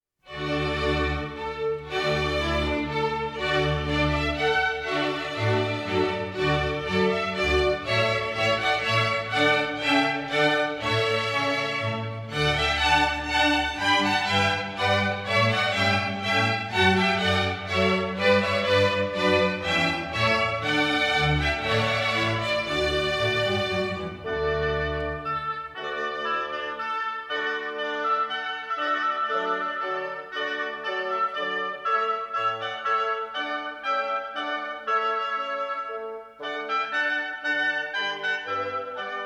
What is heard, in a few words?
Menuet; handel; Water Music; Fireworks; Messiah